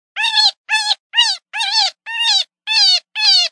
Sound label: Baby Cottontail